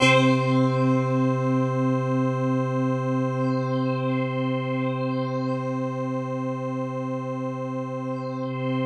CHROMA C4; Music Store Sound Service; Einstein; MOSTLY LEADS

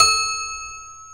A 3 TOY piano; AKAI S6000 CD-ROM - Volume 1

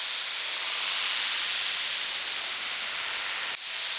sparse soundscape samples; ReLooped

